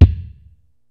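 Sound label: HIPHOP KIT, DRUMS; Kid Nepro; Elite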